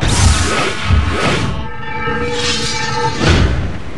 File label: iris Close; stargate; minetest